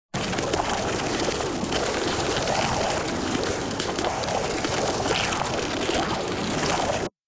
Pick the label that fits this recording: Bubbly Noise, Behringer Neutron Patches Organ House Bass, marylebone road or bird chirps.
Bubbly Noise